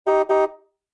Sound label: hotwire success; hijack